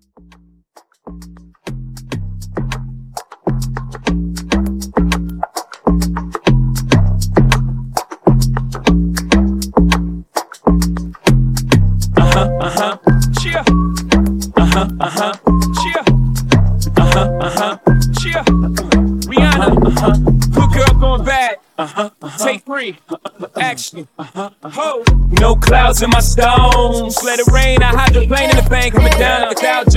Afro Beat Mash Up

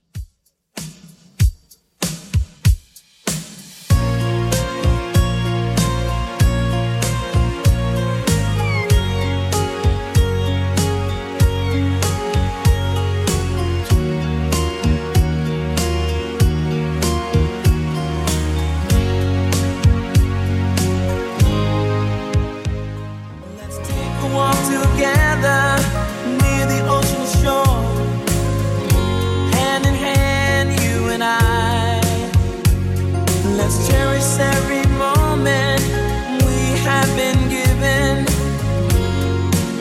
80s Redrum 96 bpm

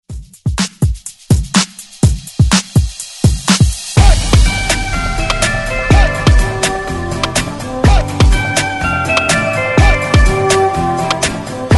60s ReDrum 124 Bpm

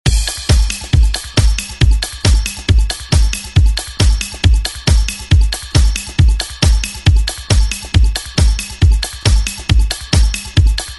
70s ReDrum; DJ Mhark

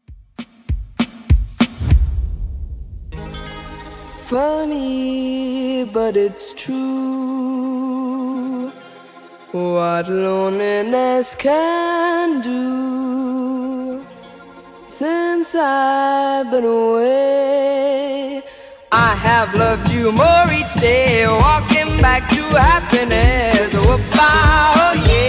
60s Redrum 197bpm

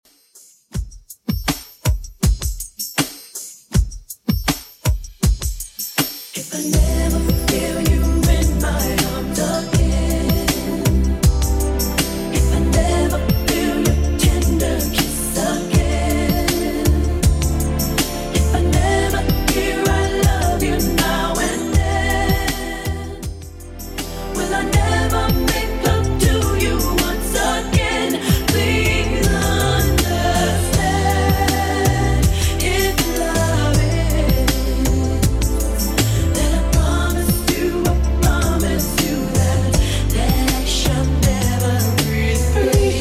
80s 80bpm; Redrum

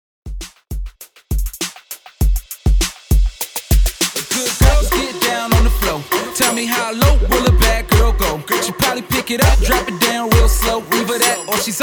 Twerk 100 BPM

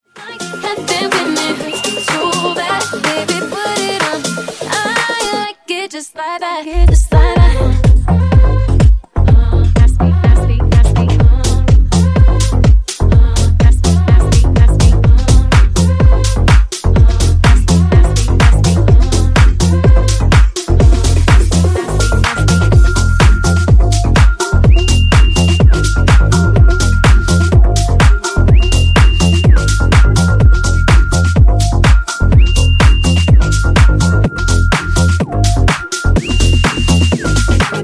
Funk Mashup Blend 125Bpm

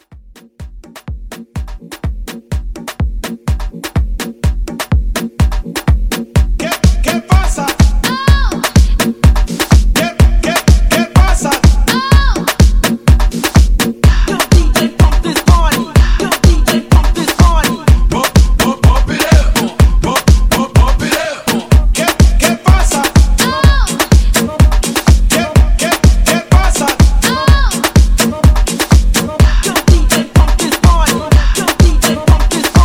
Hype Re Drum 125

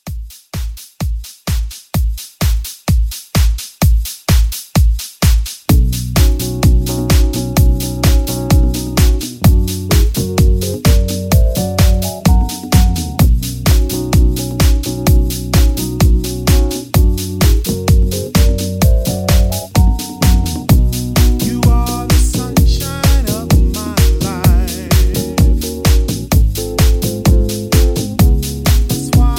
70s Classic Re Drum 128

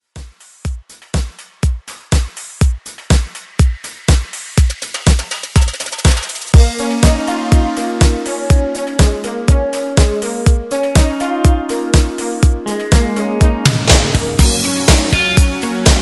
80s ReDrum 122Bpm; DJDOLLS

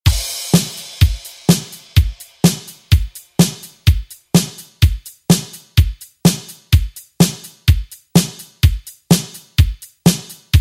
80s ReDrum; DJ Mhark

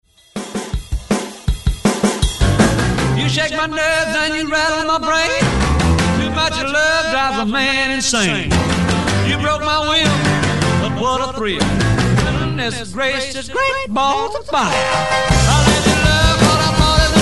50s ReDrum 160Bpm; DJDOLLS

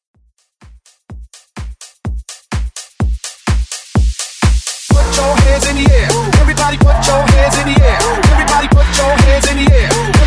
Vocal House Hype

